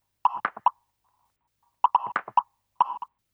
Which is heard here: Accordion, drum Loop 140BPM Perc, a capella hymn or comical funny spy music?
drum Loop 140BPM Perc